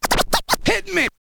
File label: scratch kit, vinyl